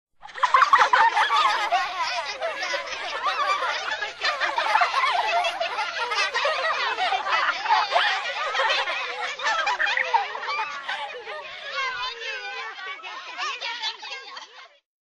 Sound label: Children laughing